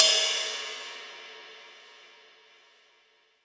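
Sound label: Ride cymbal, Boss DR660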